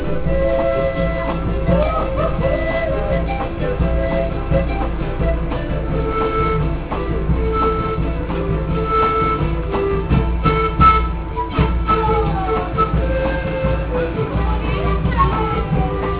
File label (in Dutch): Bandje Aquas Clientes; peru